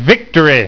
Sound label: Victory; HeartsYalta